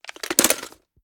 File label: inspect drum drum out; reloads; gmod